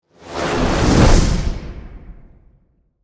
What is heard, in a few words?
Magic Spell Pass By, Horror, Magic, Spell, Pass, By, Whoosh